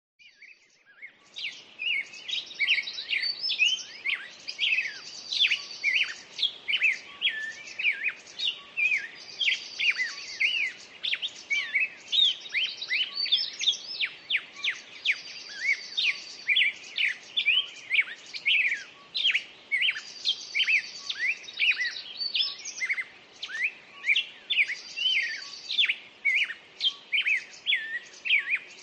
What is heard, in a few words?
the birds singing at dawn